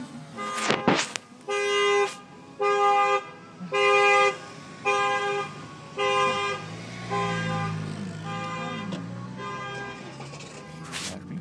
car alarm

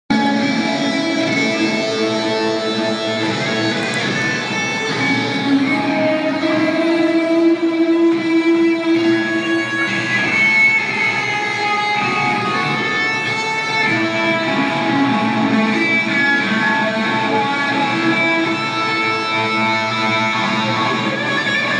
guitar solo star spangled banner; at the soundersfc game

